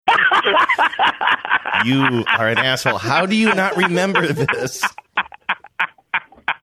laughing you're an asshole

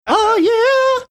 singing aw yeah

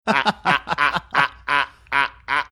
laughing stilted

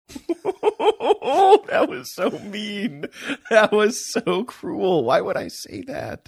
laughing saying that was so mean

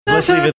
haha

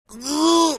making straining sound

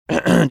clearing his throat

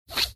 making funny nasal sound

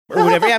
giggling

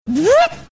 vooooop

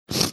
sniffling

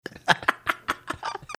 cackling

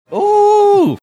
"oooooh"

